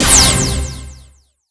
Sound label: PVP invite